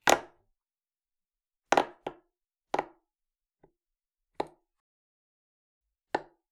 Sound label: Palette, WoodenPalette